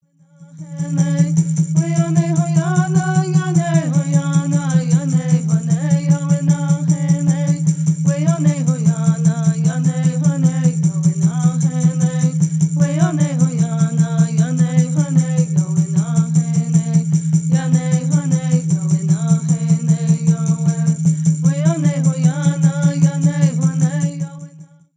Peyote Song

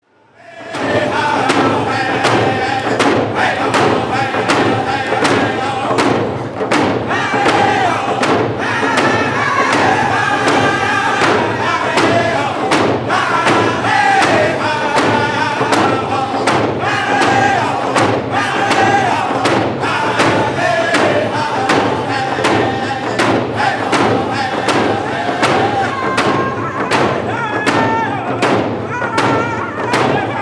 Round Dance Song

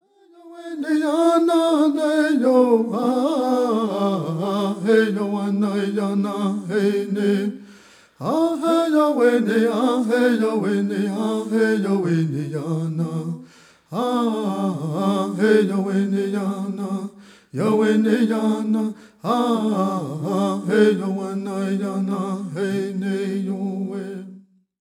Prayer Song

